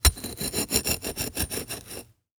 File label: Foley Pole Metal Pole Placed In And Turned Dirt To Step Up Tent